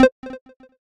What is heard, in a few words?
Menu Cancel; pac man kart rally android